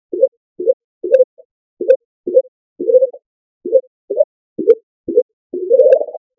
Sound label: jingle